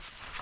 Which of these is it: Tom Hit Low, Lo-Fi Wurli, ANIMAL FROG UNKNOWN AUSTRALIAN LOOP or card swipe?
card swipe